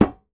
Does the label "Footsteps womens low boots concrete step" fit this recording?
No